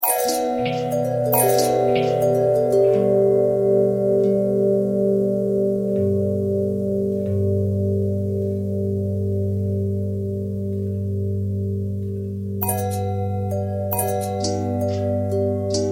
long bell long bell harmonic twist, bells

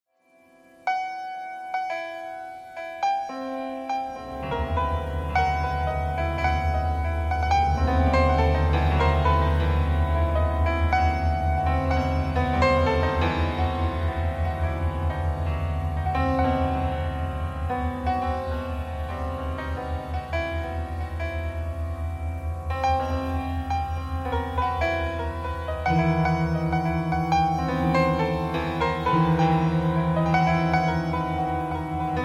piano thread noise

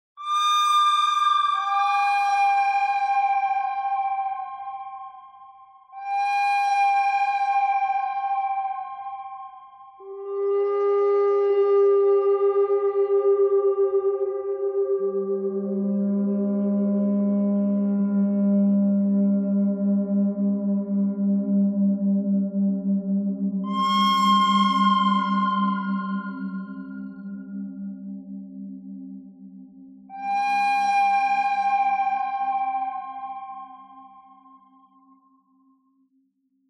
bird thread harmonic; noreverb

